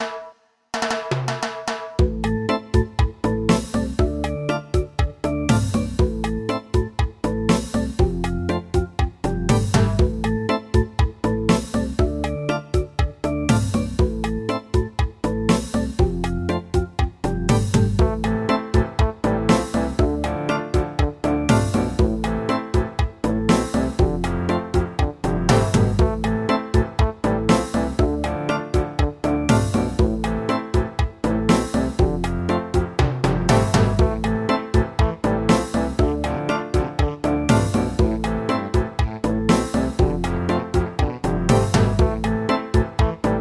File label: Reggae; runescape